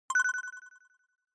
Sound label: Short Accent Delay; ショートアクセント18-5(ディレイ)